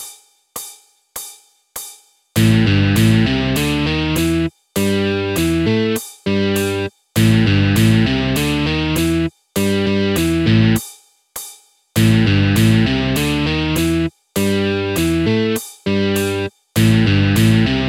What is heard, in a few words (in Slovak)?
Motiv tempo 100